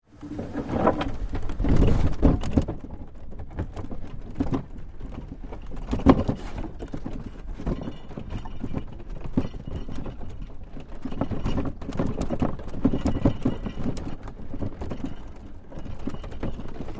Wagon Mule Cart, Onboard Recording. Hard Dirt Road, Some Metallic Movement At Start